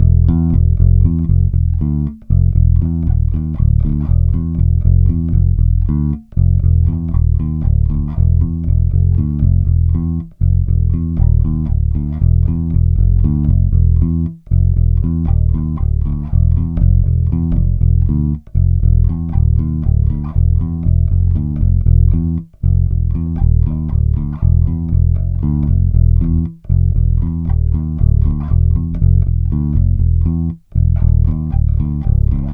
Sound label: Bass loop 118 E, Electric Bass Loops Direct; Slate Digital BASSHOLE Sample Pack